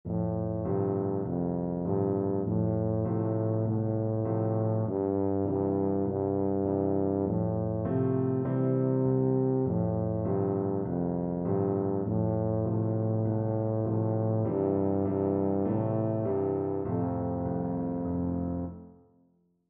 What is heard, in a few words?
mary had a little lamb, tuba